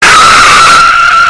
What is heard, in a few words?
jumpscare